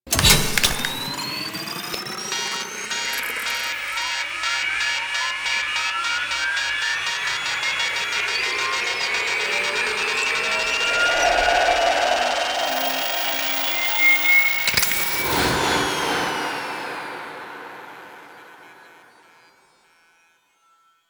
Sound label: Activate Bomb; Warframe; The New War; Grineer Eidolon Ending